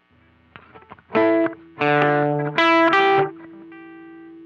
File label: LP Riff Slow